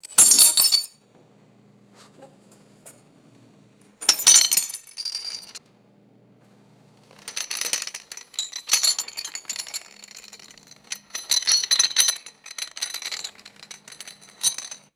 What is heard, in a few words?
ceramic tile pieces dropping and scraping; glass